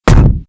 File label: Feet; Sword God; Playable Tutorial Level